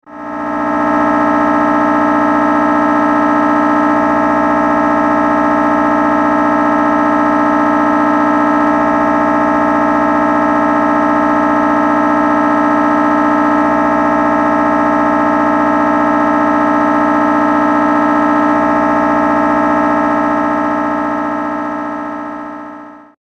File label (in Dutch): MRI geluid